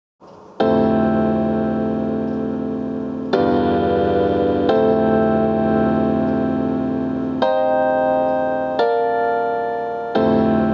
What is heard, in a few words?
Viola